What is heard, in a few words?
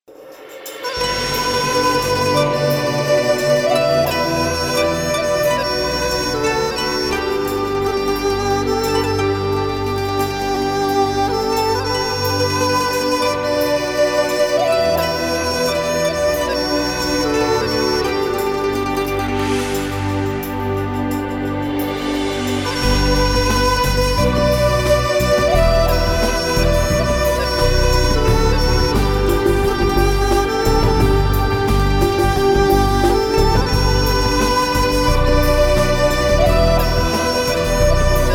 asian contemporary